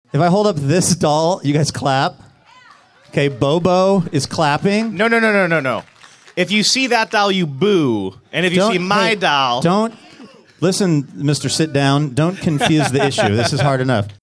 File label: clapping or booing; bobo doll